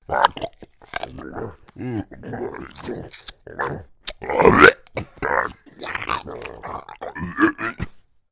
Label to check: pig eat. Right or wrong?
Right